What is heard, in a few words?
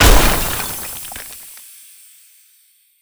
crash